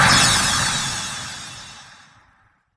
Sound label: Impacts